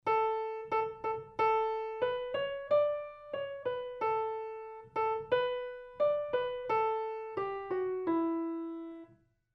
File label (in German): Melodie